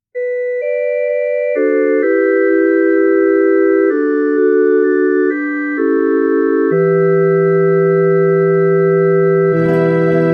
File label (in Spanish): Ven a nuestro mundo Guitarra